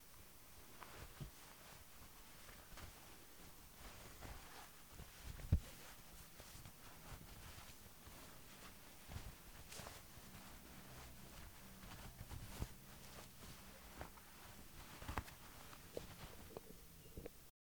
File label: Shirt Rustling Material